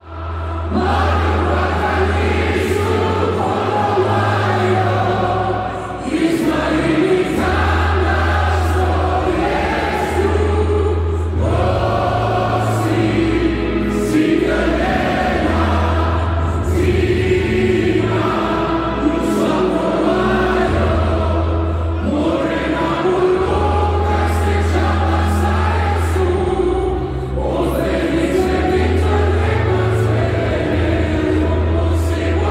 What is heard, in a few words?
national anthem, fanchants, south africa